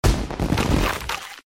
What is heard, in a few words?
player shark full activate; Adventure Time - Break The Worm; ThrillGames